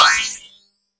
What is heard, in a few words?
powerup